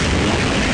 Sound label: Flamethrower Loop; 1944 Battle Of The Bulge